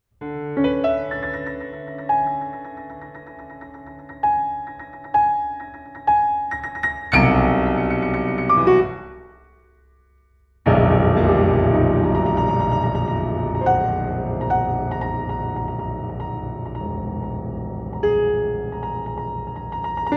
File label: piano solo suite